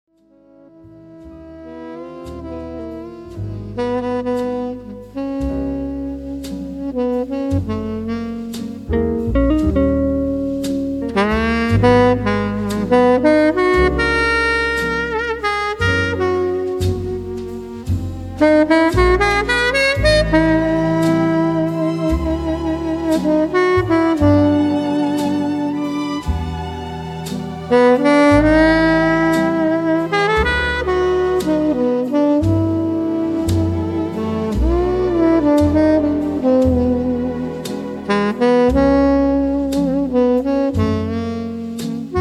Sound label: Jazz, Legkii Dzhaz S Atmosferoi Vechernego; Dukh Niu-Iorka. Legkii Dzhaz S Atmosferoi Vechernego Niu-Iorka.